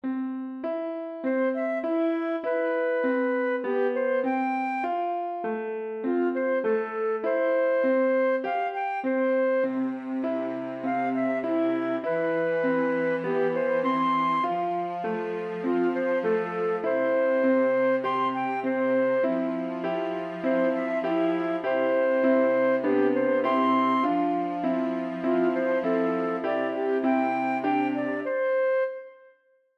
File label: Soothing Flute and Piano; arpg